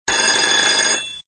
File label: RING SHORT; Hat Trick